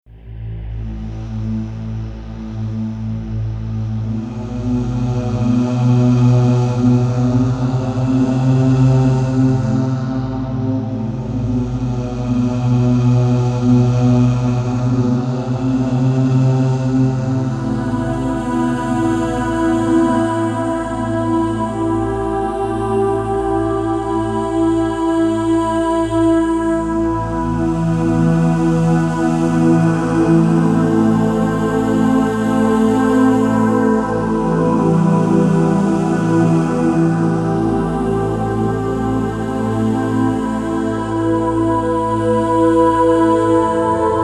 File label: ATMOSPHERIC